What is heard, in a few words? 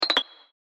position close minus